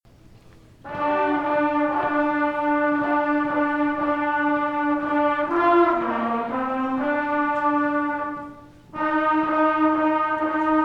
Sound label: Trumpet